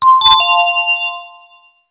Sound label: School Class Change